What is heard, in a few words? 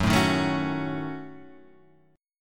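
Gb Minor 6th Gb, 8 string; strummed; JGuitar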